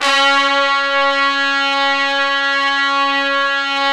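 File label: trumpets section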